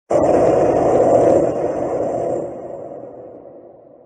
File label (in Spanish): Grito de Gengar Gigamax